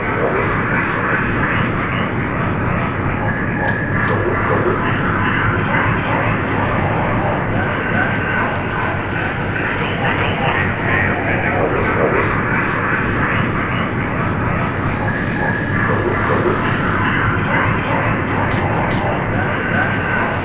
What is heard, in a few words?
crowded loop; Sonic Foundry (Sony Creative Software); Ugly Remnants VOL-2; UR 2 FX (Long)